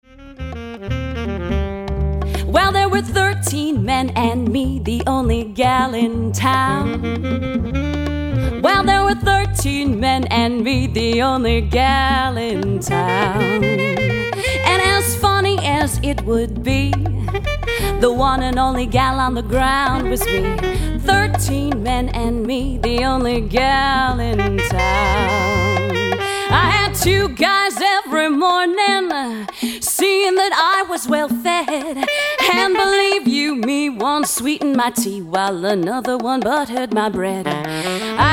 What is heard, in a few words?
Jazz Band; Seattle